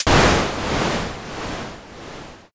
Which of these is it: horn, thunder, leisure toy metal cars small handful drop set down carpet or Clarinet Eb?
thunder